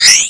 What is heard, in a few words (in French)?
cristal